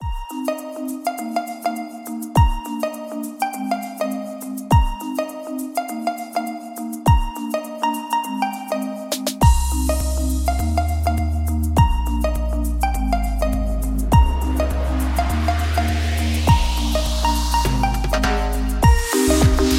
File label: Happy Holiday Mood Ringtone